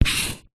office marker pen highlighter luminous stroke on cardboard